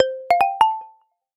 Marimba, notifications; Honor Pad-X7

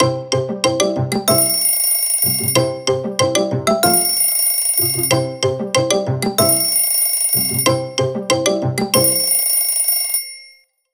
Rhapsody Of Ringtone; Honor Pad X7; ringtones

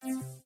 Low Battery

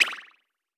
notifications; Smartisan; Smartisan-OS-6.0.0

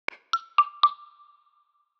Knock, notifications; Gigaset GS290

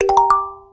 Droplets, notifications; MyPhone A919 Duo

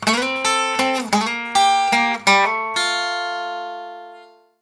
Brisk; Explay Golf; notifications

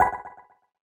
Cable Connect; LG Qua tab PZ LGT32

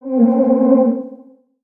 Notification; notifications

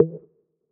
Usb Connect; LeEco Le-2

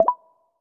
Unlock, ui; Honor X7a

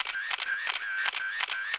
CAM CLICK; RED Hydrogen One; Camera

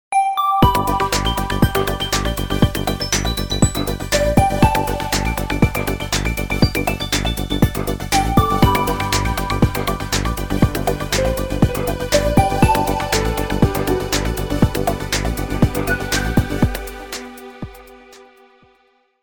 Electronic Dance, ringtones; Infinix XOS 12

